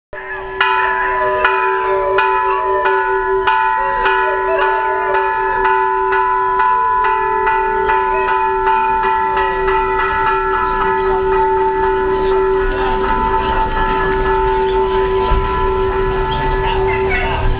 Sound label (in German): wat glocke, im Wat- Glocken und jaulender Hund; thai cambodia